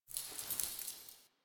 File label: idle head shake; Ambience; Warframe; Entrati; Tagfer